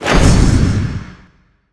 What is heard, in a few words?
dg axe skill, weapons